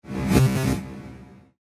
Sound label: Incoming Mail; ICQ6.5